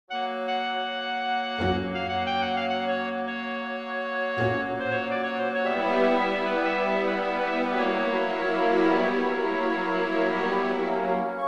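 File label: Czardas, Full Orchestra Accompaniment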